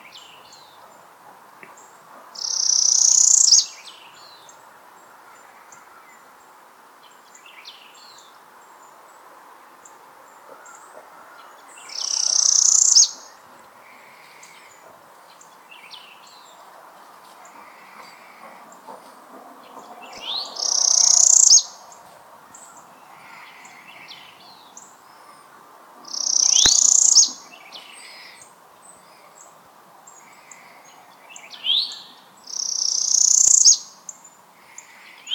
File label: Parula